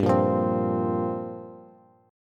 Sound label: G Minor 11th, Bass, Bass Standard; strummed; 15,13,15,17; JGuitar